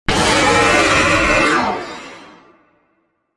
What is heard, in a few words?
jumpscare; post shift